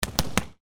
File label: boxing single punch